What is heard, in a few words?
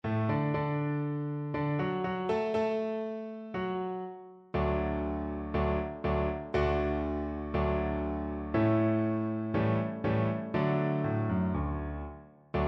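VLC karaoke, Cello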